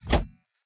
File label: reel bounce; spinsane